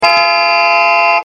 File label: Horn; Dodge